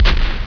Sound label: tank fire